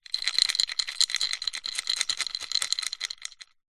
food savory snack wasabi peas in ceramic bowl shake; zapsplat